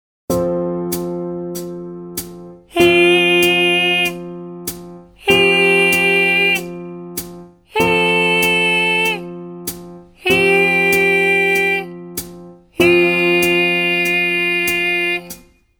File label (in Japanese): ボイトレ 息漏れのない裏声 トラック低め